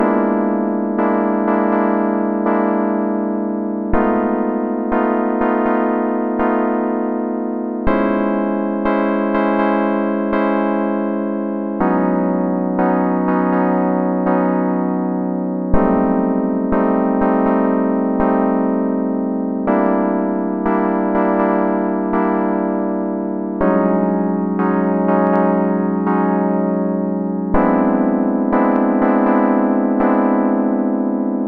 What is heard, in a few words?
122 BPM Tonic E Bmaj Gmin Chord Progress; mmb chord progressions essentials